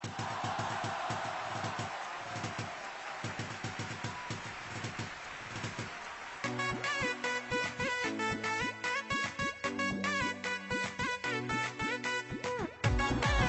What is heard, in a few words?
bengli fusion ringtone